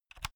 insert usb laptop hard; objekte

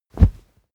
whoosh cloth low soft; swooshes-swishes

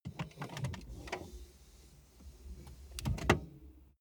car bmw e46 interior seat pull forward

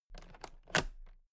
toolbox latches close heavy clatter; industrie wirtschaft; handwerk

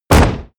hit sweetener

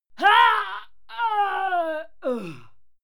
female fight die; schreie; menschen

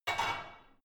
barbell only replace, fitnessstudio

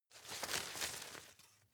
shake medium, foerster; berufe

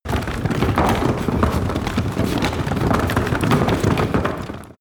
cart medieval drag fast loud; kutsche; verkehr transport

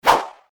fight swish noisy fast; swooshes-swishes

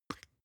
spice jar smooth open soft; industrie wirtschaft; gastronomie

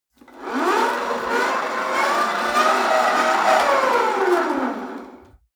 rope trainer pull sequence fast; sport freizeit; fitnessstudio

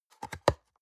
box small open medium

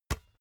basketball catch soft

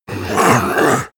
monster gasp aggressive; kreaturen

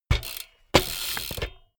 bmx bike pedal grip freewheel; sport freizeit

